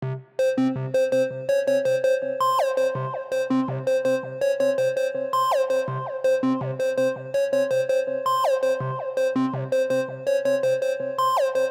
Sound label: Lead; Trap-Beat